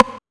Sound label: Techno H; EMU XL-7